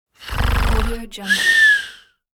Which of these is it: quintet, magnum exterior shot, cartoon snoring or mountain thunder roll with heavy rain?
cartoon snoring